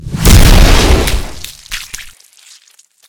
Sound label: disintegrate; Magic